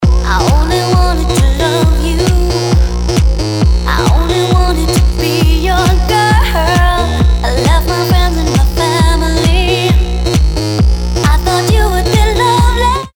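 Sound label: Electro, ringtones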